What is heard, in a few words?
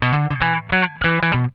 SWING IT, Funk Guitar; Zero G - Funk Guitar